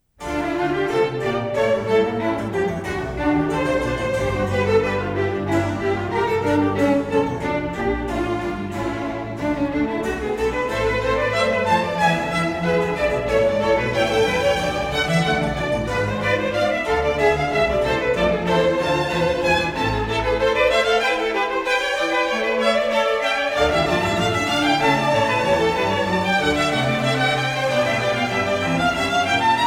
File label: Double Concerto, Violin Concertos; BWV 1043; Johann Sebastian Bach